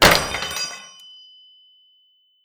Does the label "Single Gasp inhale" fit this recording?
No